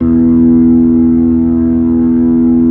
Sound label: B-3